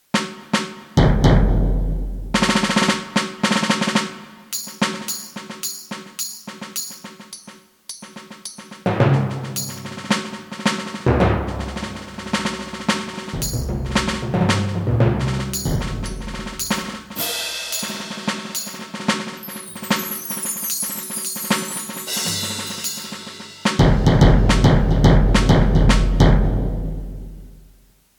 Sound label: ROLAND SPD-SX Pro Orchestral Drums